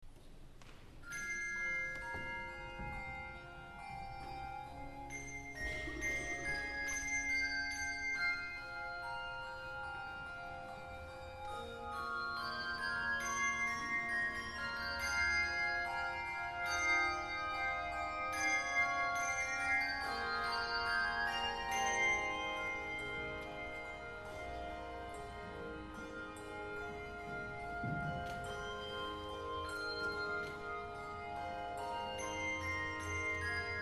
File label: Resonances and Alleluias, handglockenchor; Zugabe